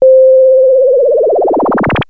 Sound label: Access Virus B; Synthworx